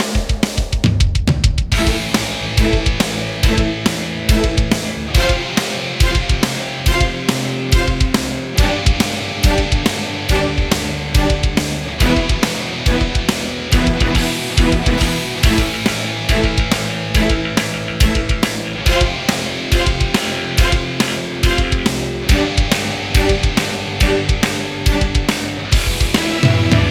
TENSION

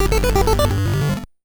leaf pickup

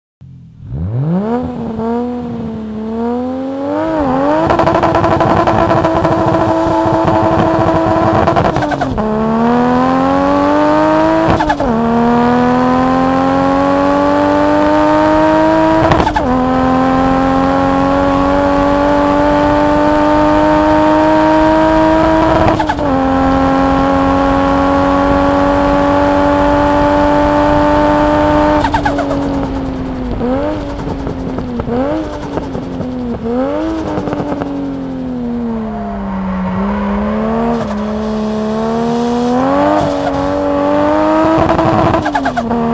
Exhaust Sound - Supra; Kaido Battle; Vocal Exhaust Original Soundtrack

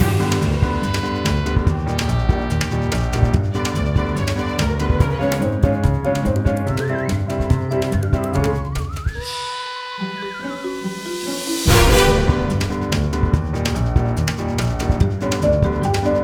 Chilled Silly Loop; ABC Wipeout 3; Wii U

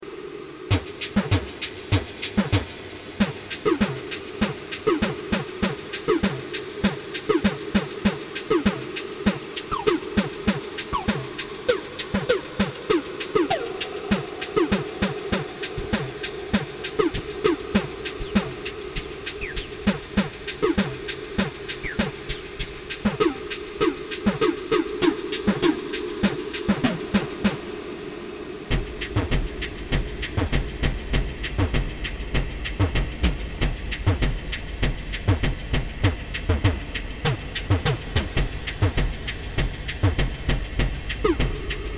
Drum machine; deios ii deidia

